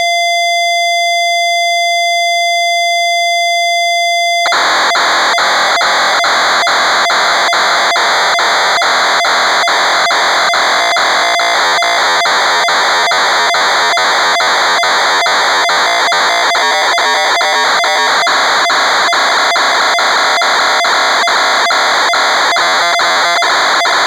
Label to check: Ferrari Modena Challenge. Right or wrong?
Wrong